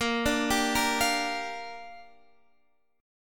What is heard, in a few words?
A sharp 6th, Guitar Standard; arpeggiated; JGuitar